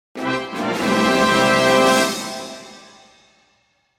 お披露目ファンファーレ

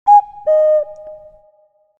カッコウの鳴き声

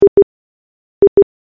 電話のコール前の電子音

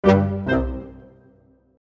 シュールで古いお笑いの締め

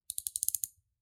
カッターの刃を出す音

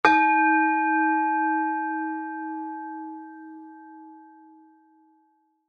木魚と磬子のお葬式セット

